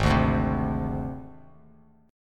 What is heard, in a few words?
Gsharp Major Flat 5th, Bass Standard; G#Mb5 strummed; JGuitar